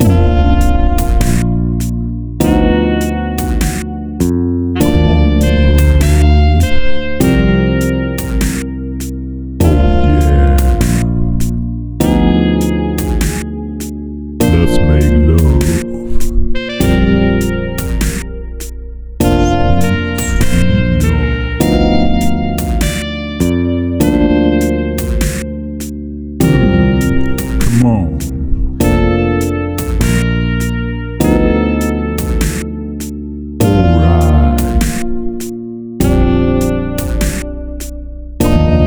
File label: Dirty sexy sax